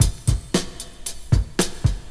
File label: honky tonk